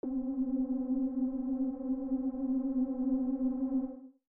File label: Lead; A Panthor